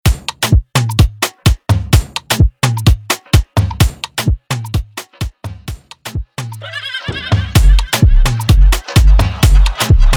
afro house 128bpm

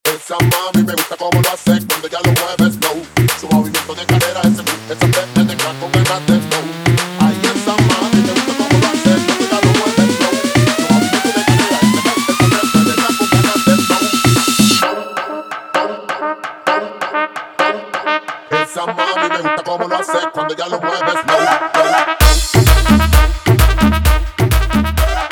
intro outro 130 bpm

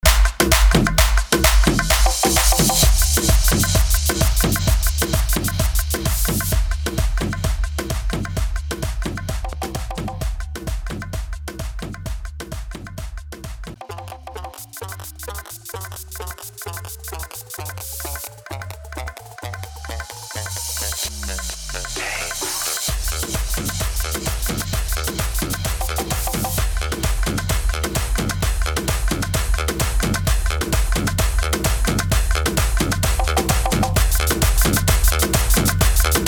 130 bpm, Bootleg Mix

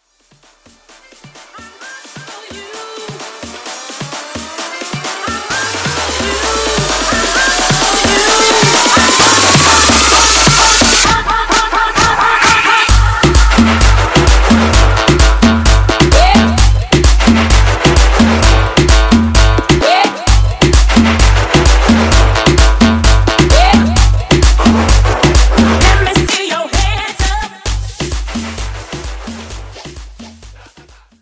guaracha

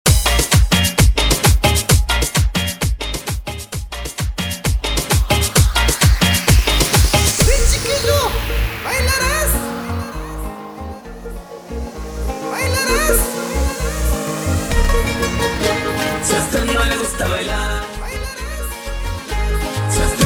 Extended 131 BPM

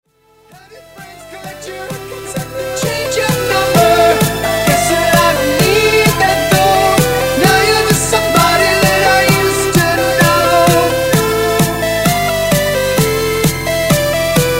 EDM Mashup; Prodeejay Remix

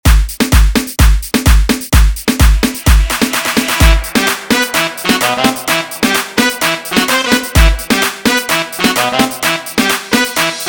aleteo 128bpm

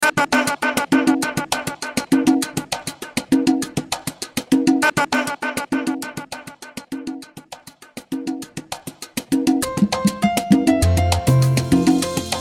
Intro Outro 100 BPM

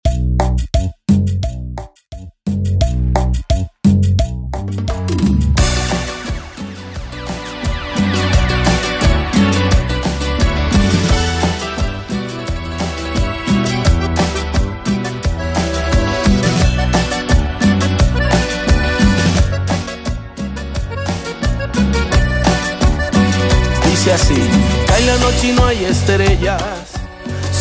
Intro 87 BPM

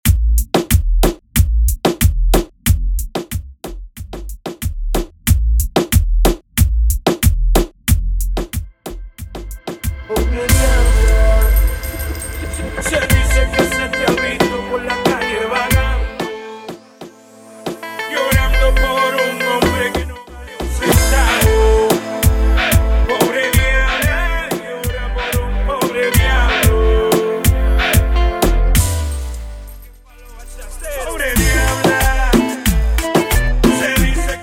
Intro Outro 92BPM

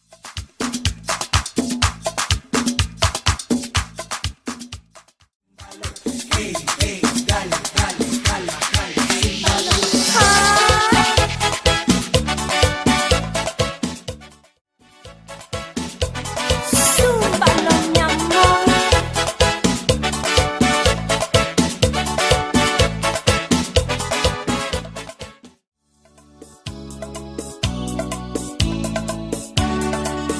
Extended 123 Bpm

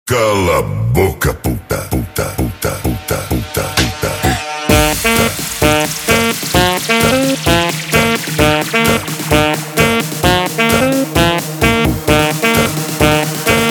mashup intro outro 130 bpm; Open Show Mashup

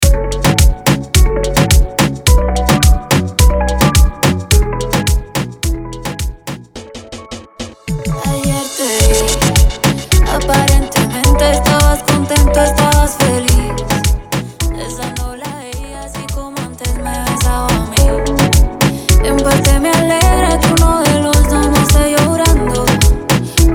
Intro Outro Break 107BPM

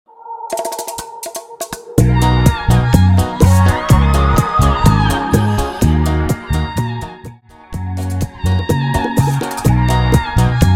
TrapChata 125 BPM; TrapChata Project; trapchata bootleg remix

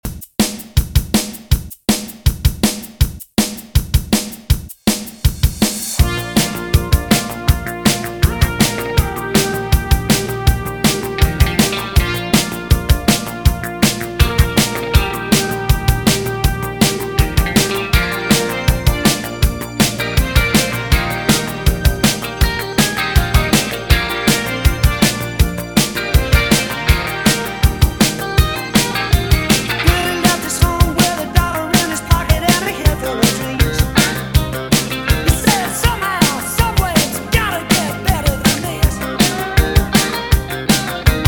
80bpm

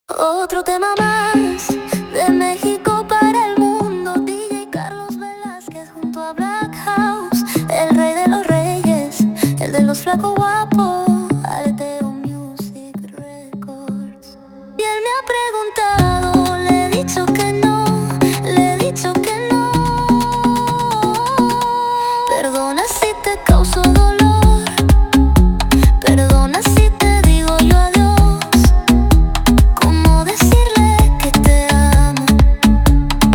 128 BPM

